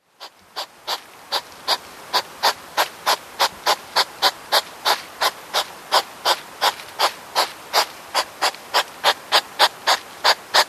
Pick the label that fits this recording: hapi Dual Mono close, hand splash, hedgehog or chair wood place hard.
hedgehog